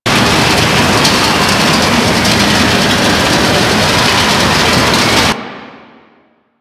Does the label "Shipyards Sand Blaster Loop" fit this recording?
Yes